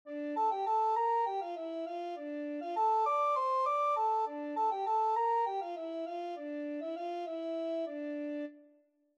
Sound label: texture monophonic; texture exercise thick and thin